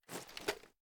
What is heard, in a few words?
inspect; gmod; viper